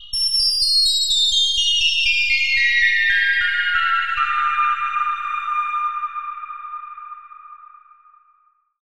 ATMO Heavenly Chimes; Phasewaver; syntheway

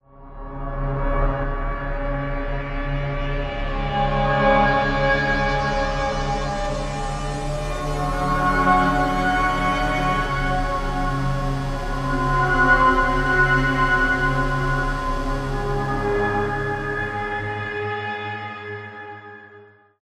Oscillator ATMO Psychedelia; Alphatron

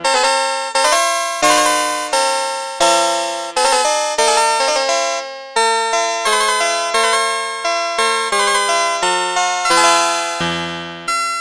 Electric Harpsichord; Phasewaver; syntheway

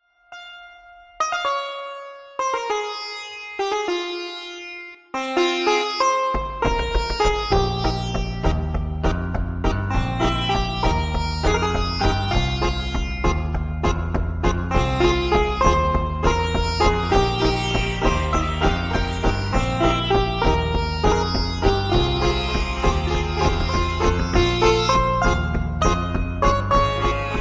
Virtual Sitar, Indian Tabla; Bassesland Bass; Syntheway

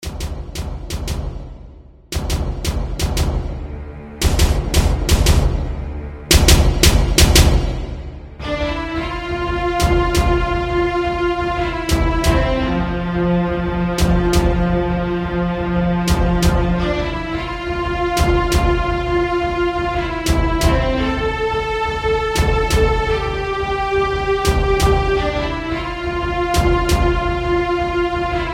Choir, Strings, Brass; VST; Audio Unit; Terminator 2 Judgment Day; Magnus Choir; Syntheway Strings; EXS24

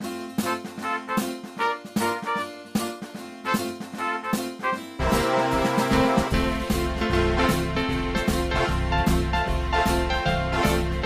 Strings CP70 Brass; Syntheway

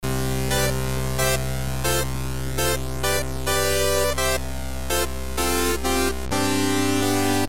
Hard Lead; Zephyrus; Syntheway

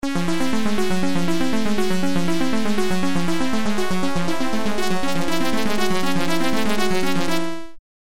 Intermittent Shapes; Stepattern

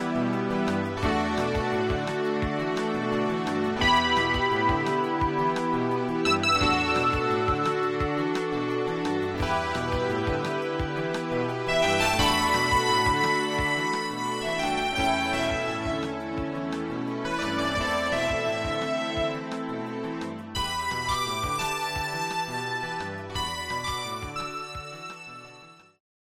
LEAD; Synthelium; Alpha Centauri

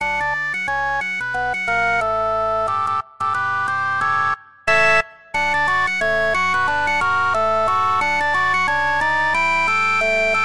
Calliope Circus Organ; Harmodion; Syntheway

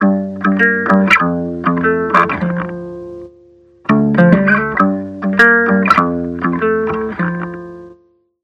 Stereo Flanger, Guitar Dry; Sonicircuit; Threadbare Vinyl Record; Syntheway

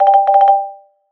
outgoing ring

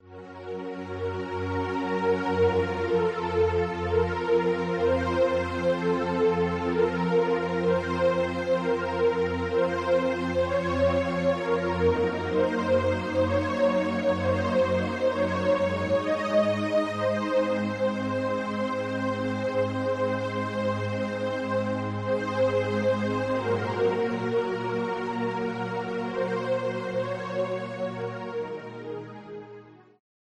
PAD Orchestral Strings; Synthelium; syntheway

